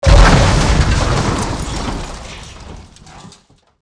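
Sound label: engine crash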